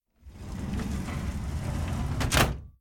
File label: Elevator Ding Close